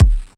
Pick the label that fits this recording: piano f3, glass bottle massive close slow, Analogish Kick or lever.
Analogish Kick